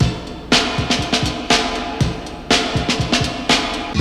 120 bpm vintage oldies drum loop sample B key, Free drum loop sample - kick tuned to the B note